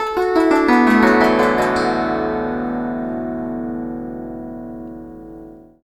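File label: guzheng; Spectrasonics; Heart of Asia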